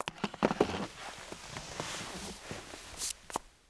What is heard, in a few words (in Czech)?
poposednuti; Mafie 1